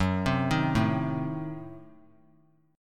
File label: F sharp Diminished 7th F sharp; arpeggiated; 18,19,18,19; 3C,3F,3A,3D